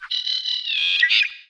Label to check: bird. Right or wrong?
Right